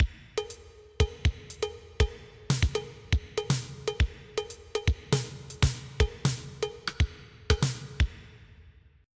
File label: drum